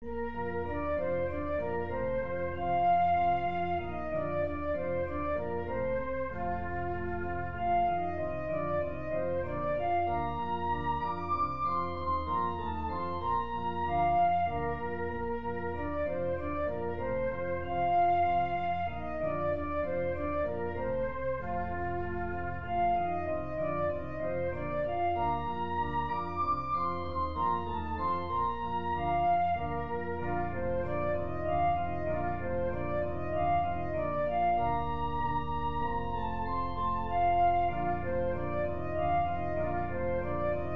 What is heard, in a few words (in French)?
danse allemande sib majeur; haydn joseph